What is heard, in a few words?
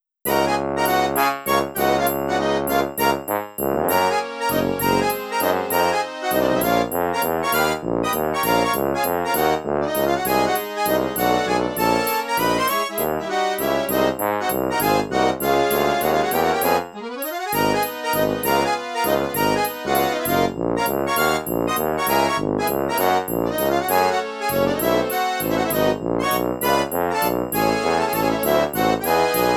Happy Waltz-ish